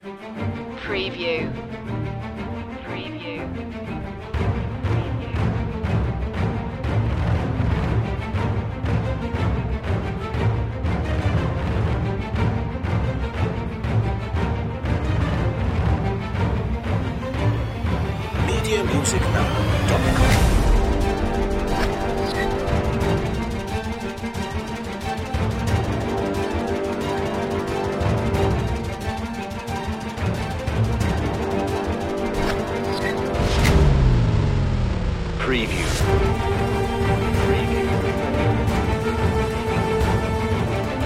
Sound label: pirate attack no solo violin